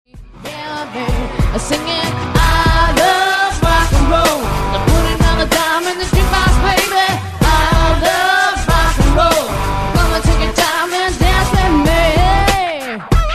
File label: smooth & groove